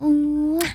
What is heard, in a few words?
kiss